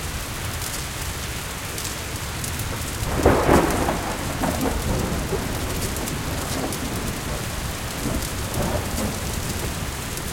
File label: Sarai fire